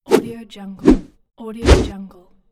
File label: whoosh